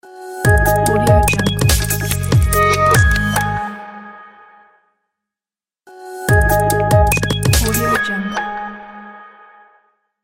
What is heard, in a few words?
electronic logo reveal